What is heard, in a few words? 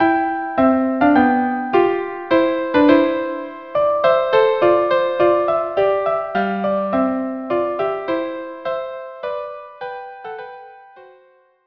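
violin viola duo; La Generosite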